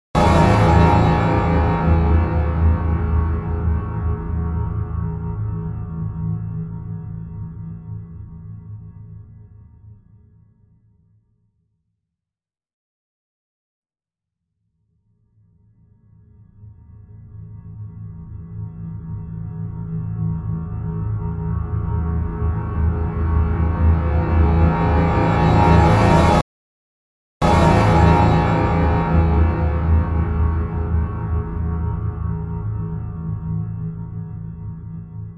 Processed Extract Of Upright Piano Extended Weirdness Close Mic; Rutgermuller; Upright Piano B